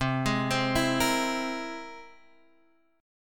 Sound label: C Augmented 7th, Guitar, Drop D